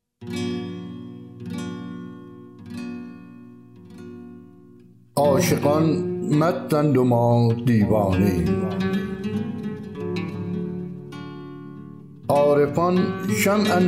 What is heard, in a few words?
Vocal